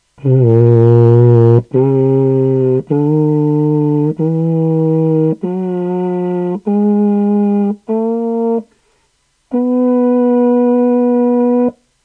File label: serpent scale; Sound sample played on the mock serpent replica presented in the photo area of this group